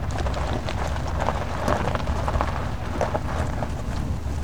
Auto Tires Gravel Loop; Automobiles

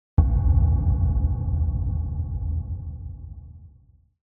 Impact Drum Dull Low Wooden Reverberant Hit

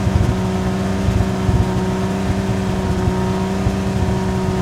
Jeep Wrangler Loop; Trucks

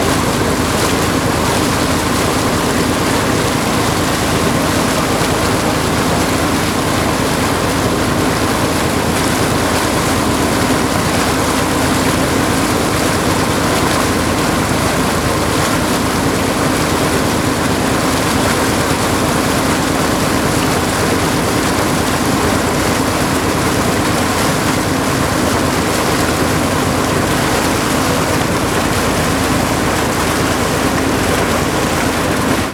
boat steady on board; best service Blue Box; sea traffic

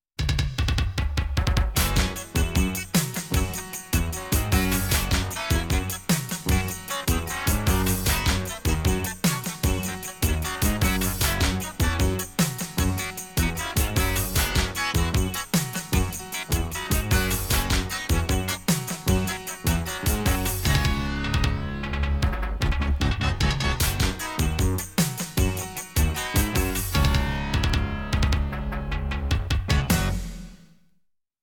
Medium Tempo 'high Tech'

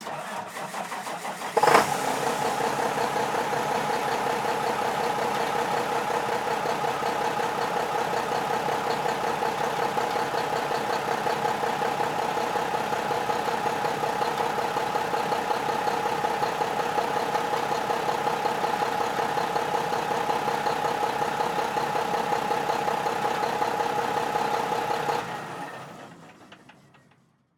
Semi Truck Mac; Blastwave

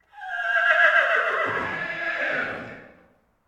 Animal effects snorts grunts farm ambience

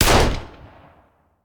Hand Gun; Sound Ideas; Guns

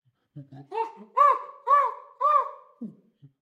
Monkey Win; Pitch Black Audio Pong

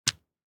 DICE on Felt, Drop, Standard, Six Dice; Samples; ArticulatedSounds; DICE Sfx Party (Extended)

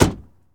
Truck Explorer Door Close; Trucks

